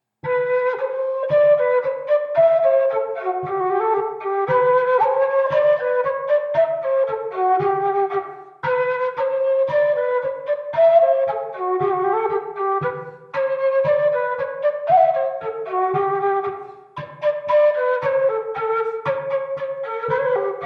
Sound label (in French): Bourree; Recueil De Raoulx